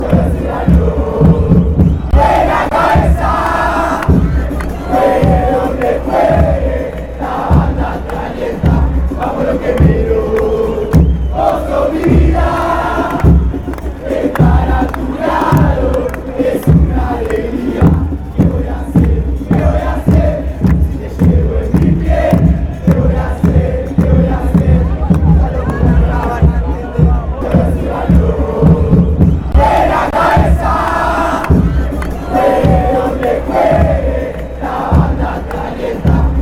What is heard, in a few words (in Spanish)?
huracan chant hu hu fanchants